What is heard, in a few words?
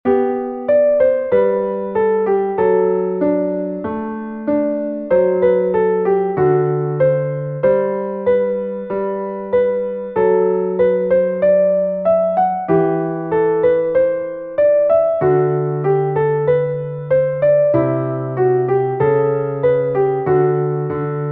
winter easy piano